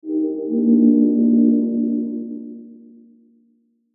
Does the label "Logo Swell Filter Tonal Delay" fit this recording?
Yes